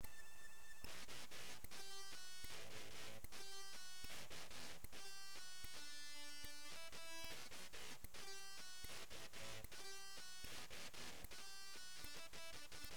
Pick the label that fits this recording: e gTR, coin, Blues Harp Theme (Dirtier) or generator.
e gTR